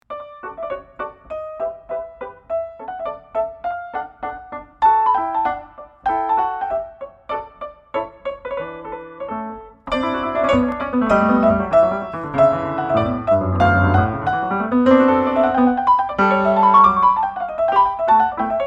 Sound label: Sonata in D major Allegretto